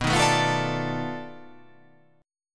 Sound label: B 7th Sharp 9th Flat 5th, down